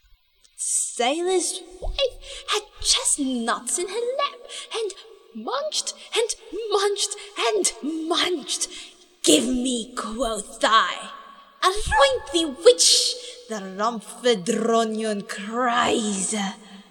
Munched And Munched And Munched

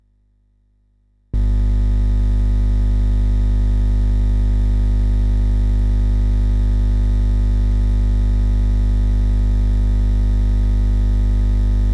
Static Jack Electric Current Sound

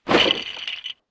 Lego Fall Apart

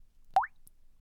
Little Drop Of Water

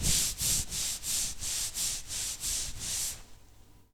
Towel Wiping

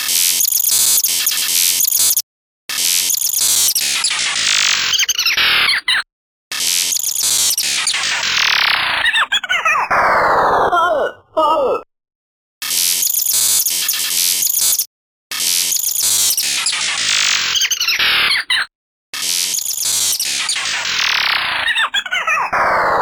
Rhythmic Loop

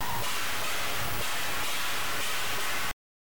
Growl decimate

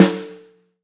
Snare Close

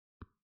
Touchscreen

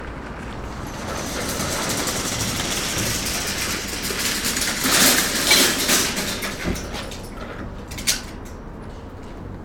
Shopping Cart Return

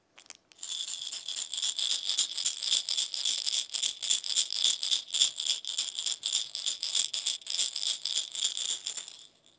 Baby Rattle

